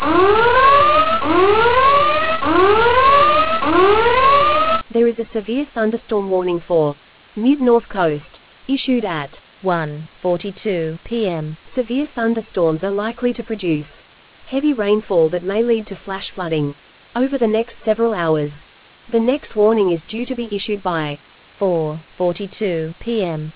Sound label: storm audio